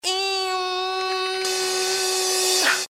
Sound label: eeeeeh